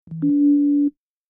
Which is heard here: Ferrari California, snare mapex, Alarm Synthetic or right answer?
Alarm Synthetic